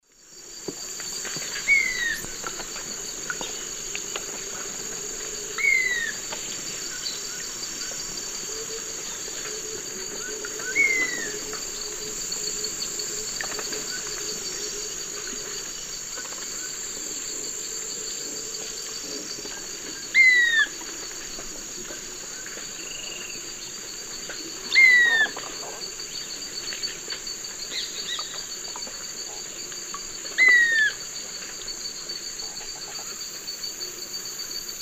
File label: Myiarchus swainsoni, calls and song; S. America; Eastern Lowlands